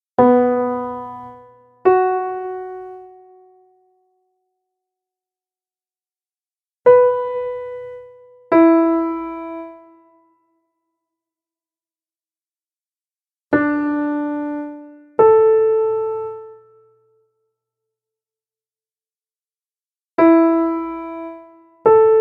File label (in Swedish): Vilken ton är ljusast Intervall inom en oktav